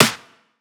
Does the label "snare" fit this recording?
Yes